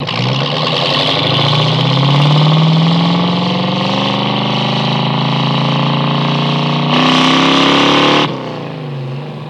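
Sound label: Mustang Accel From Start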